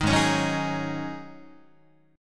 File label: Ab 7th Suspended 4th Ab down Guitar